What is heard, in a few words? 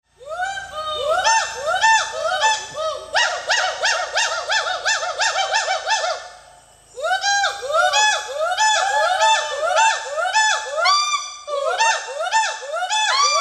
gibbon